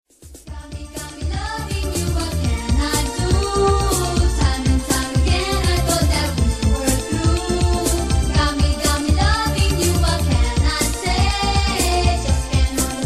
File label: latin freestyle ringtone